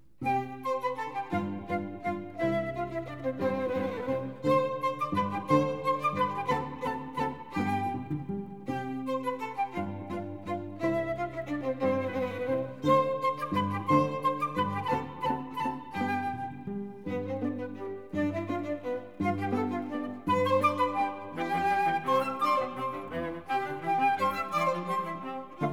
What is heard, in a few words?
Quartet for flute, violin, viola & cello in C major; K. Anh. 171 (K. 285b); Mozart; The Flute Quartets